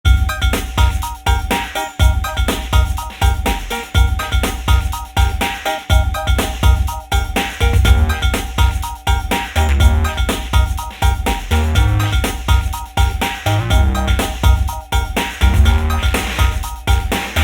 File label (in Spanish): Música instrumental alegre divertida música de fondo para videos juegos niños infantil rápida